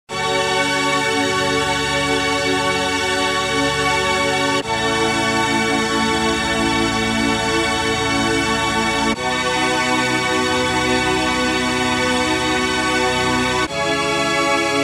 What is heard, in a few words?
pad string, Roland JV880; Pad: bright